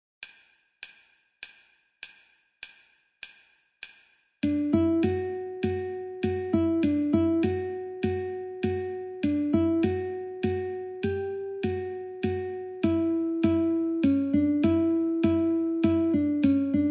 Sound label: Guitar